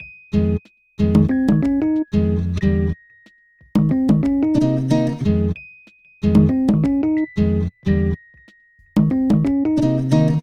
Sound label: Melody, Hip-Hop